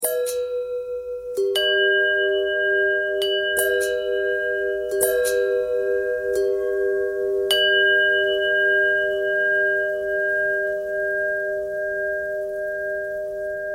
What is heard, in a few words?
bell long bell thread pentatonic